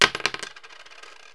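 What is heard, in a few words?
medium coin; Wired for Sound Pro CD; Aristosoft, Inc.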